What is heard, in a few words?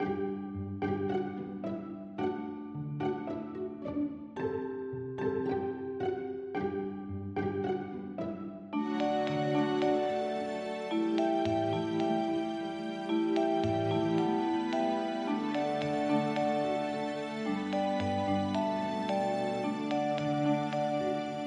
playful innocent music cue